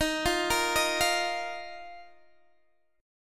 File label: D sharp Suspended 2nd, Guitar, DADGAD